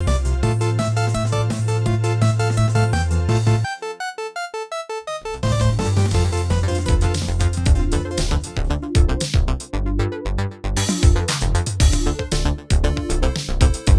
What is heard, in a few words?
lossless transition